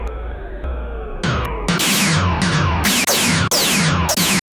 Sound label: winning a combat after a, victory fanfare